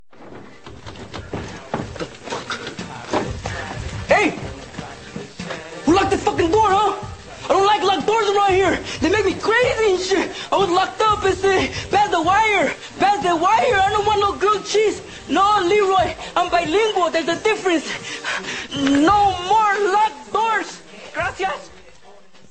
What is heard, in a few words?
Pads And Wires Ringtone